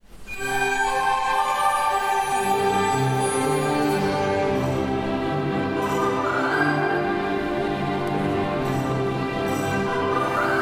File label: Unterstufe Jingle Bells